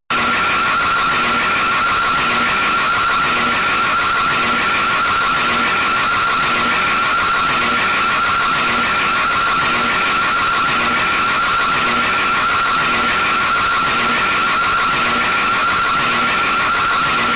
very loud siren

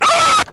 screamer

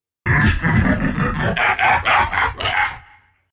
laugh; velkoz

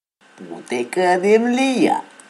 hahhahahah

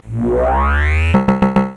attack charge; crablet

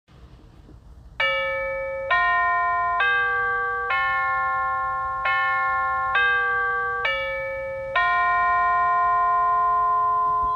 bell and door

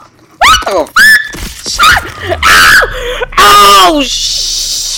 screaming

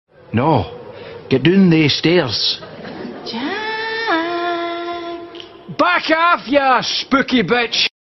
very spooky

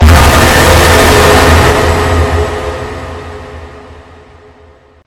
jumpscare sound loud

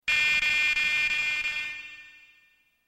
scream with echo

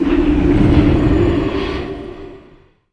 astral recall; wow

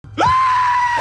robo screamer

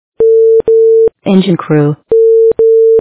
engine tone

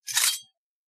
hidden blade draw

